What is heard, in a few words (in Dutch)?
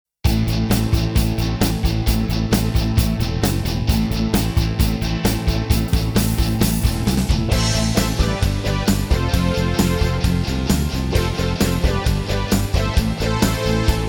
ROCK GITAAR